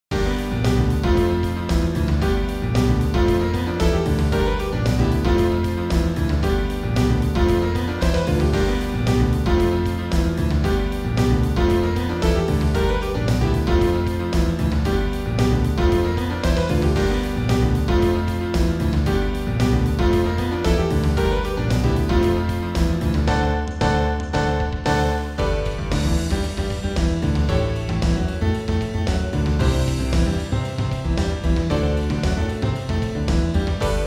karaoke

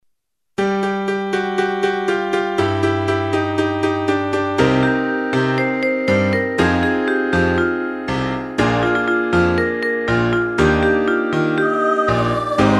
inst

